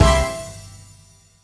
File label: Magnet Groove (Outro); Mario + Rabbids Kingdom Battle